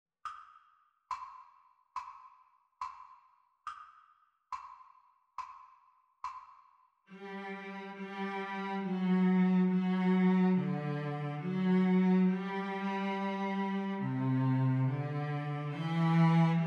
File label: Cello Duet Playalong Practice Speed